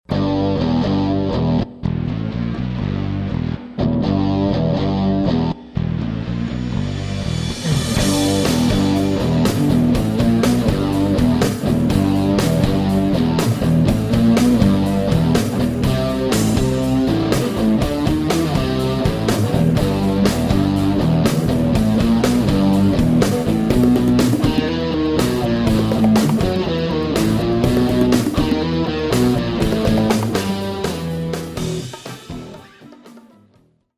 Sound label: Rock; honduras musical 9no grado